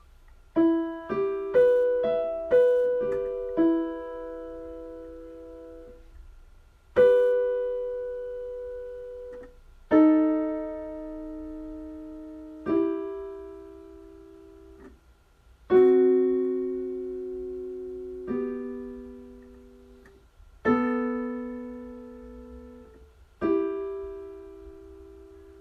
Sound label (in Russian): Интервалы